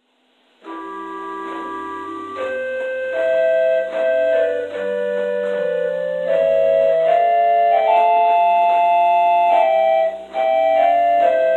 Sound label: Interlude